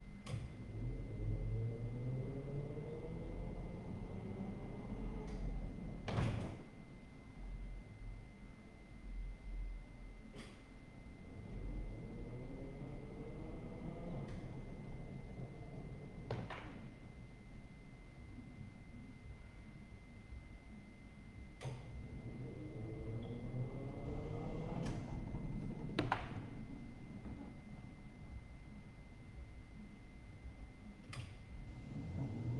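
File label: bumper car exterior impacts start maneuvers hit stop various; ortf mkh8040; Sonniss GDC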